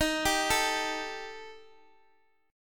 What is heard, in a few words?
Eb Major Flat 5th, Guitar Open D